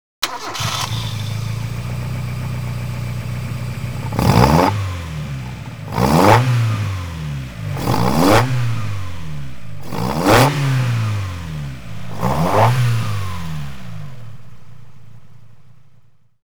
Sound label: Ignition Exhaust Sound; Initial D 5th Stage Sound File; Initial D Music Lossless Collection